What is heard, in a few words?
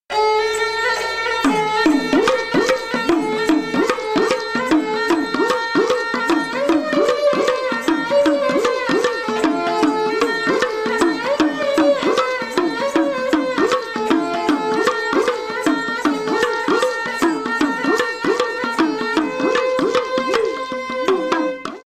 punjabi sarangi